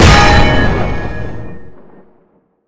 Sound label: Smasher Smash; Galaxy